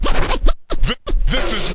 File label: turntable, Scratching and Turntablism